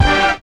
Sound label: DISCO FX; Zero-G; Funky Elements